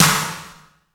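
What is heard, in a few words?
METAL snare, effected drum; 90 sSampleCDs; Crypton - DrumBase5000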